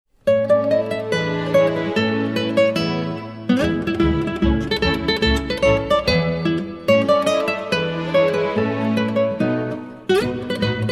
Chitarra Concertante Gitarrenkonzert A-du; Giuliani

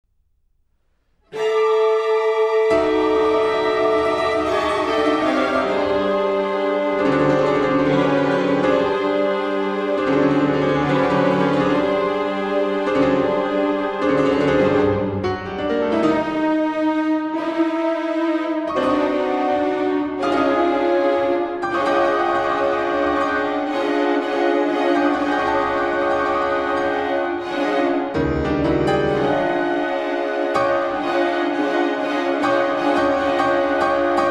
Piano Concerto; McCabe

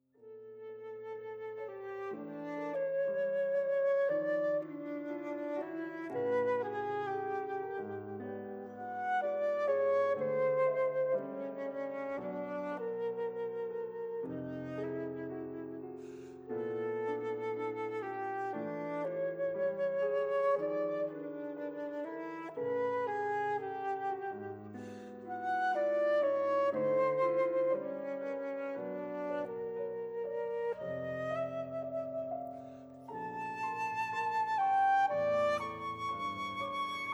Sonata for flute and piano II

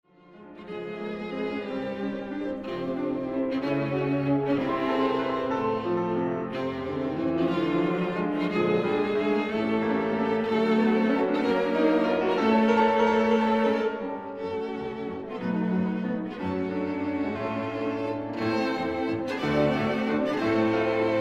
Quintet for Piano and Strings; Franck

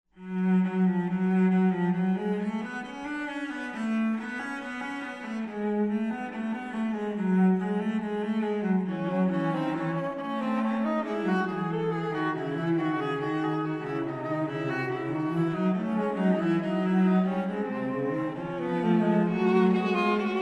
Fugue in F Sharp Minor; Ciurlionis

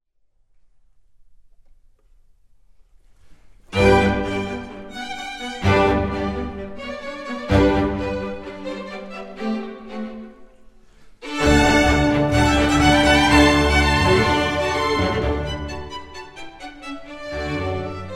Concerto in G for Violin and Orchestra; Henkel

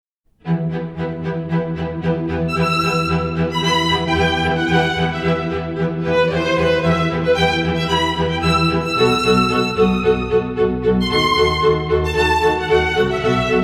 Chitarra Concertante Gitarrenkonzer; Katkus; Carulli

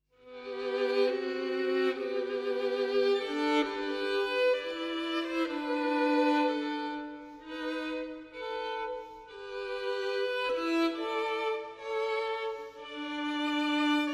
Violin Concerto; Beethoven; Sondeckis

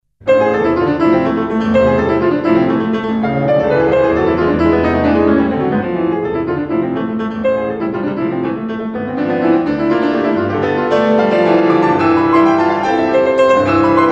Prelude in A minor; Ciurlionis

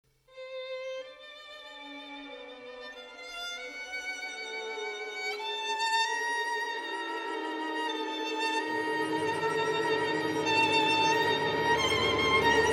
Violin Concertos; Mozart; Sondeckis; Hueber; Violinkonzert

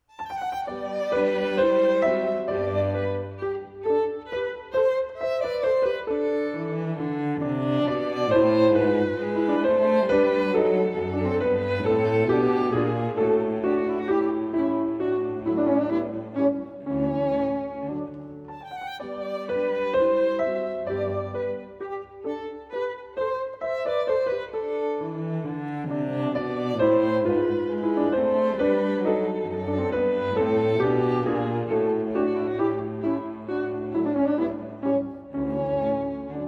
Piano trio; Haydn

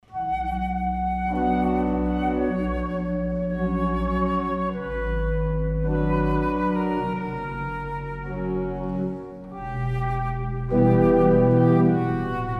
Prelude h-Moll; Čiurlionis

